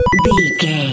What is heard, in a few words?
retro beeps collect item